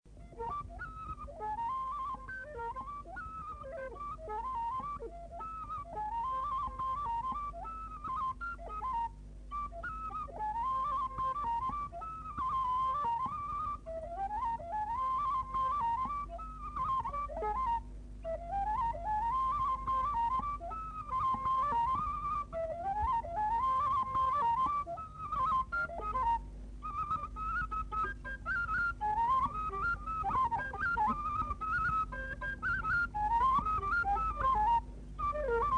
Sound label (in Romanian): Hora la fluier; jocuri si cantece batranesti; Vaslui